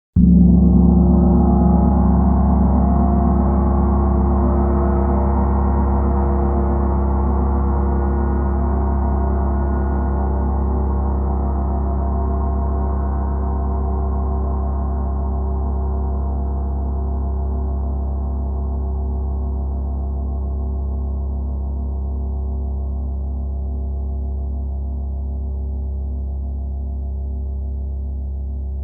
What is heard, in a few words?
Erde 38 Sweet, Gongs